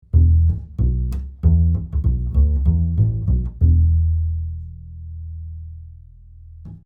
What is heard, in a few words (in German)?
Neumann KM184 Upright Bass Echoschall